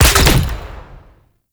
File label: Grenade Shot; Warframe; Lasrian Soldiers; Weapons; Lasrian Chem Nox